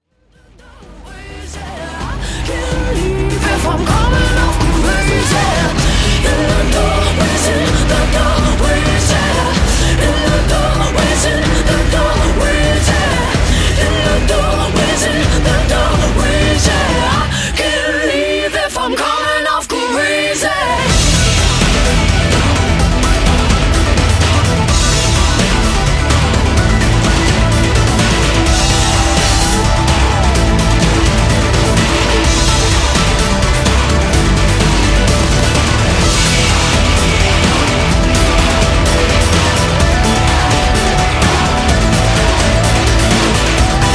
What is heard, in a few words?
Indus